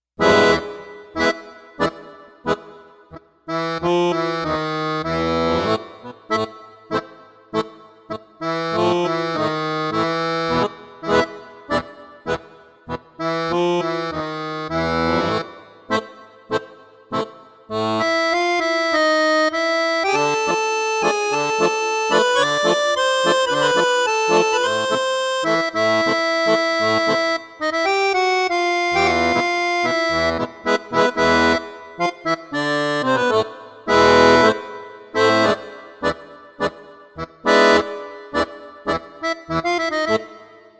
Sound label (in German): Tango Akkord, synth